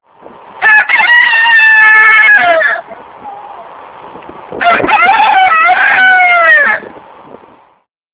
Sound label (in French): chant coq